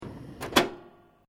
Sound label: HOTPLATE GAS PALOMA IGNITE SWITCH OFF, Portable gas cooker; Kitchen; Household